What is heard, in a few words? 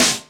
sizzly snare drum A key